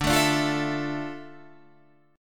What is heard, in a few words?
D Minor Add 9th; Dmadd9; strummed; JGuitar